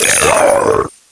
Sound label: Deer Attack